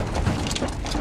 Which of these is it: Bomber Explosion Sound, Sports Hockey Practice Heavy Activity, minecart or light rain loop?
minecart